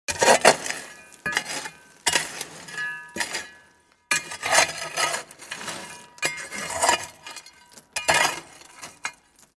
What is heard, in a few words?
shovel